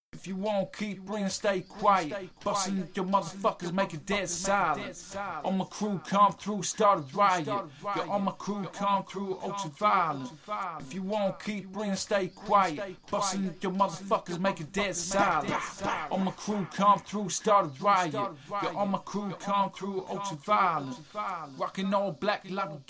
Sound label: 180BPM Key Am, Acapellas